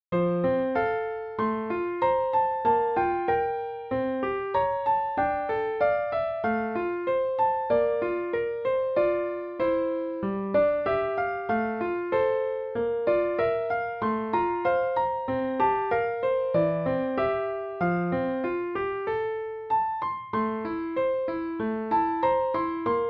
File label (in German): orgel; Klonoa